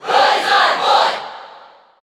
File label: Cheer English; Roy; SSBU